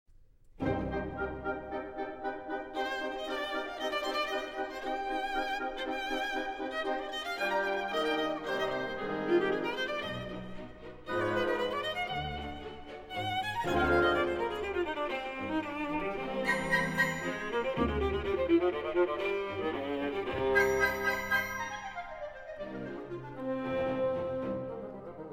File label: Viola Concerto in C Minor Allegro scherzando